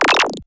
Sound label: juice; Gonner